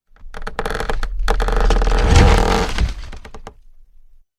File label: Coffin; fa battlemaps